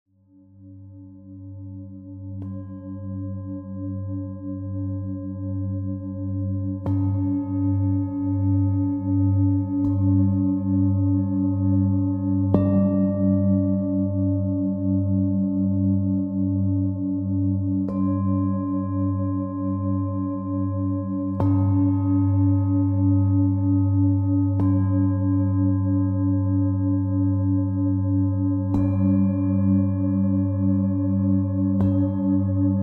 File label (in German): klangschalen wurzelchakra tageston; klangschalen chakra meditation